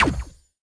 sentinel Wisps attack; heroes nyru

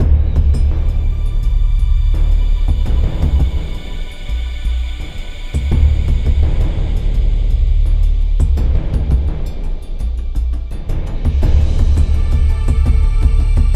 music jungle preparation; savage

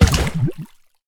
Water enemy death

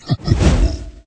demon death; veznan

